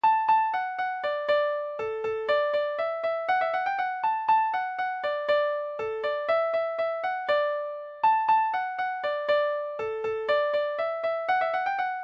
bugle horn

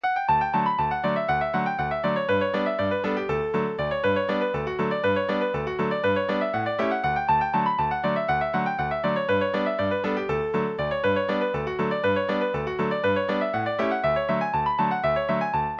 hoedown polka, session tune midis

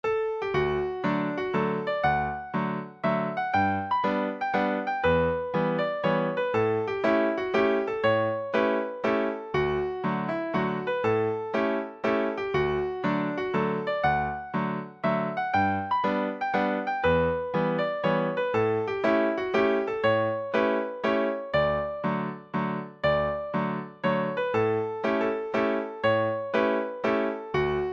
french-canadian waltz, session tune midis

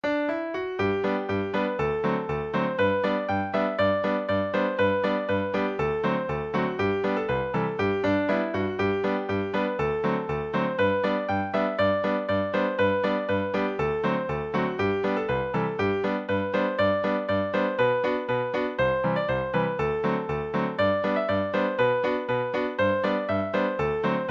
monks march

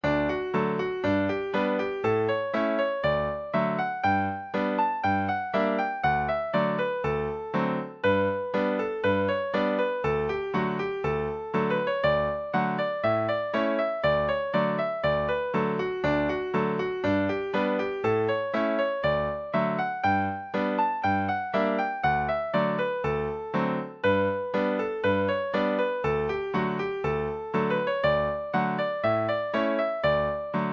hornpipe; birchards

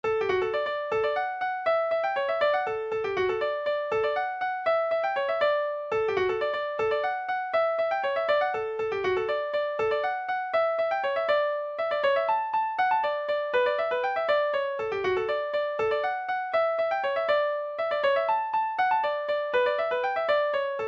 waltz; saxe coburg

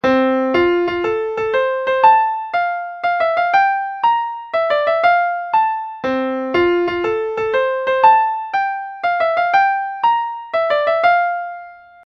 new tyrolean waltz

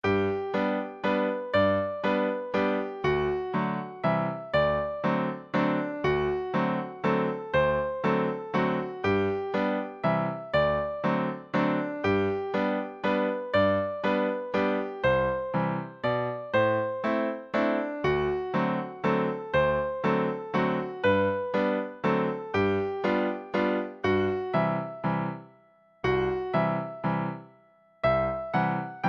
mexican waltz

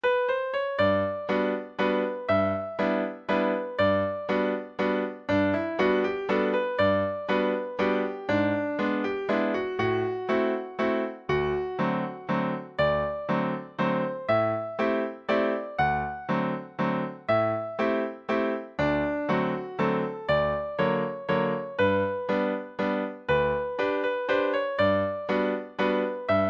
waltz; riverside; irish

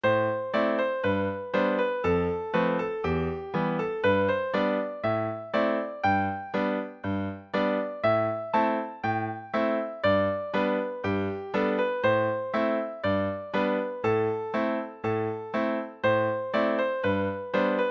polka; ballydesmond

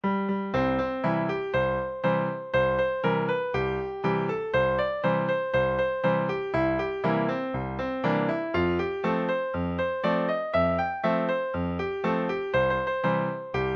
honky tonk swing, session tune midis

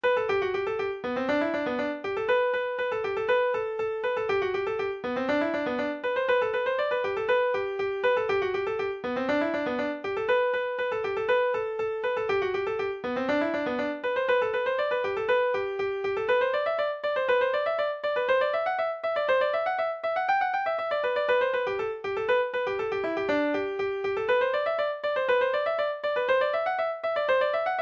old zip coon reel

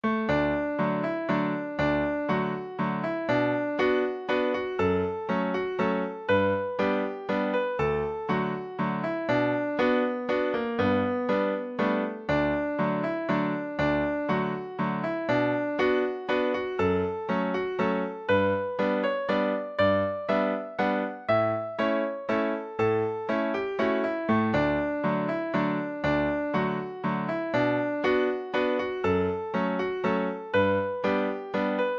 waltz; amelias

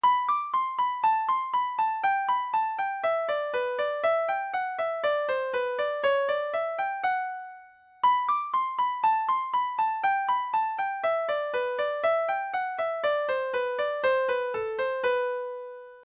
a part (harmony); blackberry blossom

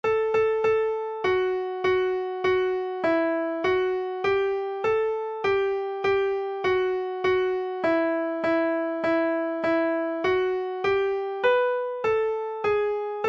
PIANO TUN

